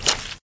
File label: slosh; echoes